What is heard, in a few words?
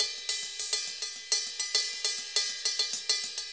Ride, Festa Latina, Drums & Percussion; Best Service; Festa Latina VOL-1